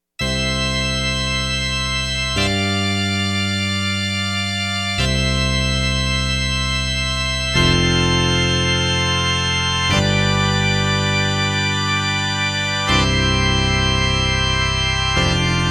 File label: Pipe Organ